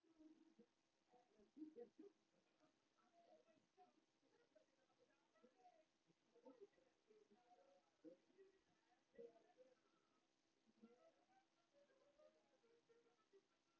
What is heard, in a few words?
Talit Blessing